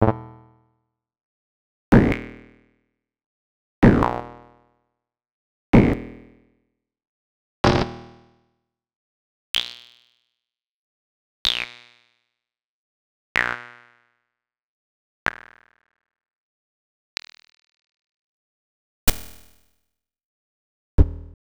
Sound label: Electro FX; X-Static Goldmine 5